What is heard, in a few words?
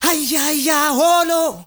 MALE SOLO, Heart of Africa